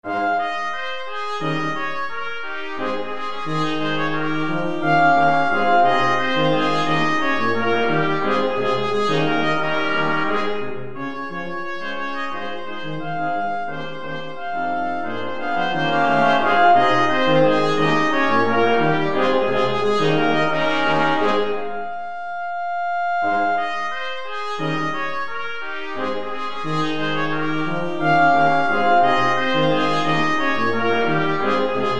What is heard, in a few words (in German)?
blechblasquintett